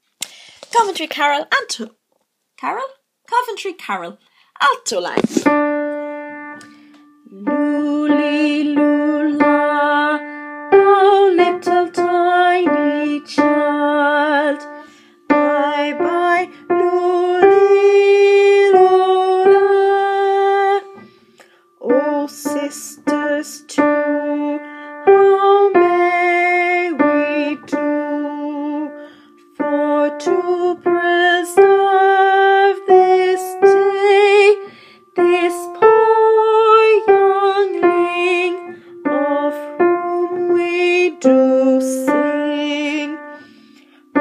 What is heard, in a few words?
Coventry Carol Alto